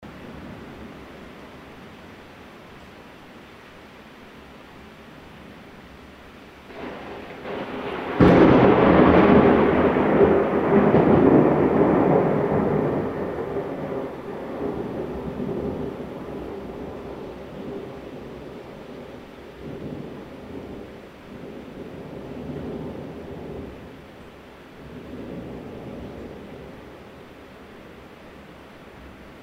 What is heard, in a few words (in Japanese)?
kaminari